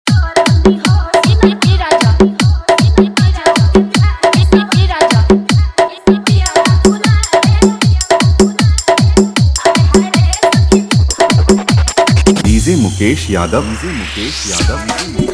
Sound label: Bhojpuri Dance Remix